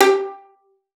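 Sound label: violin pizzicato G5; Xsample VOL-5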